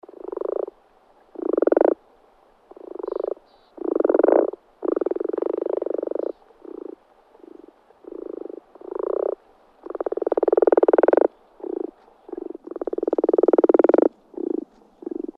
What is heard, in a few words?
rana temp